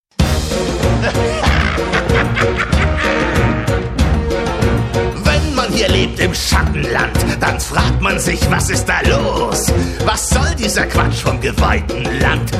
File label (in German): Synchron Gesang, Vocals (Gesang), German; Lip-Sync (Synchron); Die Garde der Löwen - Synchron - Gesang 1